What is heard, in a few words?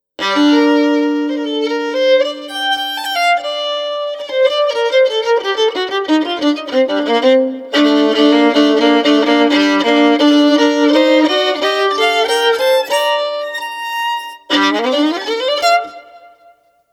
E VIOLIN sound